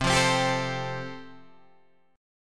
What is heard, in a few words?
F Major Add 9th C, Guitar Standard; Fadd9/C strummed; JGuitar